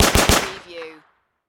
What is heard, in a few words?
Submachine Gun Sten